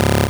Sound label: GB Bass C#, chip; chip hits; CHIPSHOP HITS